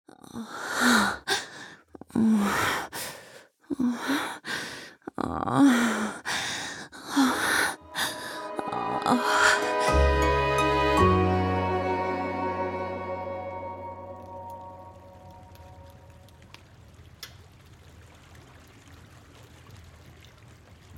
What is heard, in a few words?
female moaning; Zartbrennend; Pool-Flirt & Kamin-Lust Die Kunst der langsamen Verführung (Sinnliche Geschichten); Sinnliche Geschichten Podcast